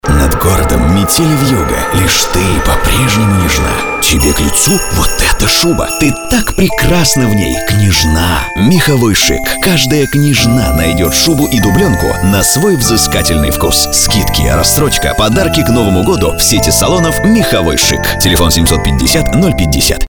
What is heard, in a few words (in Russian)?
Мех шик звук